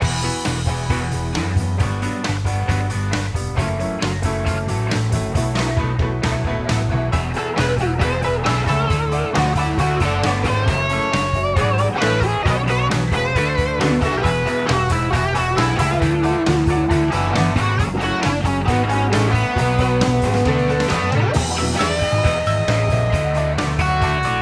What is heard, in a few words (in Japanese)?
Rain drop Rock and roll ソロあり